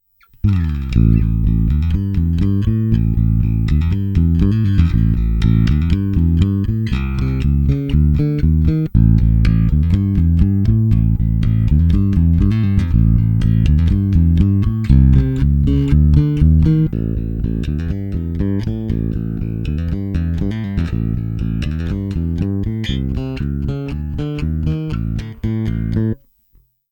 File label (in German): Finger PU Mittig Vorn Hinten EQ BS High Voll; Toby